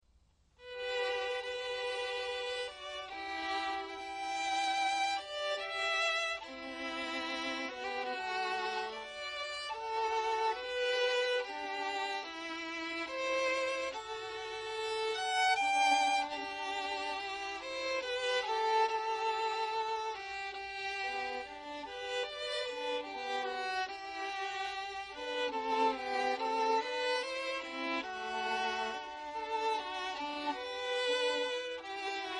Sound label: passacaile duet, violin; handel